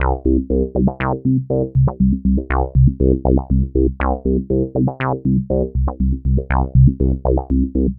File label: Bassline, Prototechno